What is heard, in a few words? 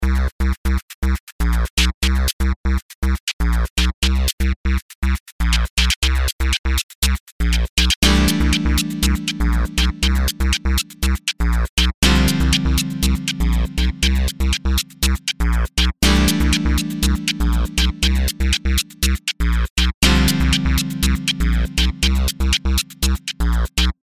Ableton Operator sleezy